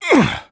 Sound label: throw